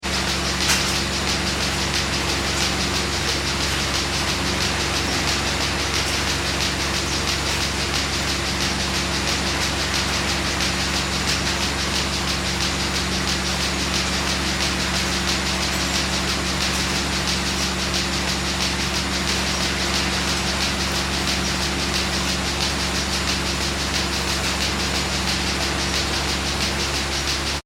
industrial machinery farm milking machinery operating; Farm Milking Machinery ( Goats Farm ) Operating; Machines; Farm Milking Machinery Operates; McKinneySound